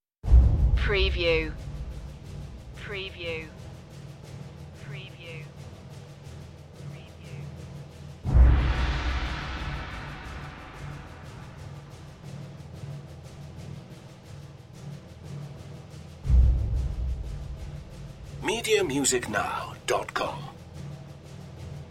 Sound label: perc